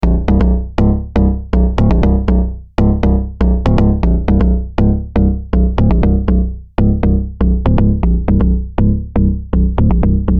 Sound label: CZ Bass